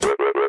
airlock deny; Machines

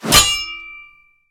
blade parry, weapons melee; civ13; civ14

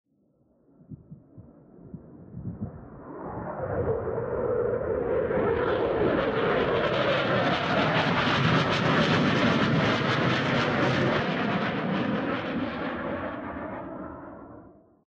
f16 right-left, Aircraft; Vehicles

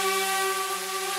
drone active, Engine; Vehicles

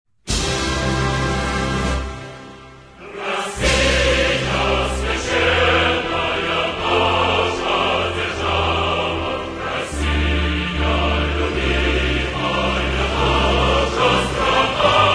russian anthem, music; Civ

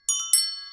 bells, Footsteps

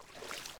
water, footsteps

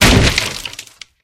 wood destroy

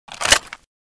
barrett mag out

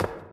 wood, Footsteps

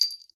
jester step, Footsteps

